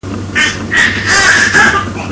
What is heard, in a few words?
squeek, baby; 7mnths